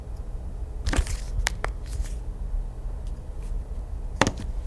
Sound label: Picking Up Cell Phone Off Of Countertop